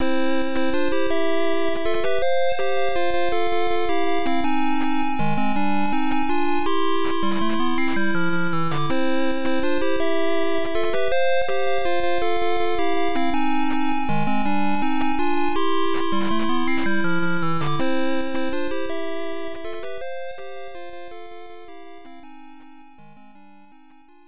Item Shop; Bomber King; MSX

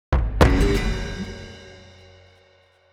Pirate Sunk; Blackwake

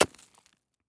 ice hit; teardown; ps5 windows xbox series xs

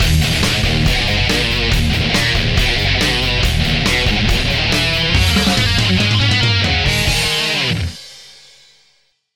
You Win; 911 Fire Rescue

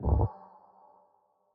Mech Sample Machine Button Press; Mountain SFX

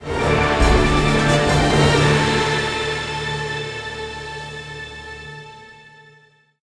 ball saved; DW How to Train Your Dragon

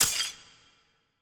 Card Finish Break

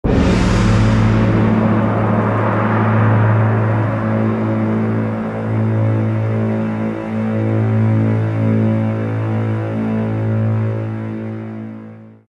Shot A Crash; Hulk; GC PS2 Xbox; Banner

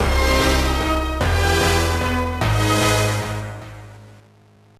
Unused Death Jingle; X-Men: The Official Game; GBA

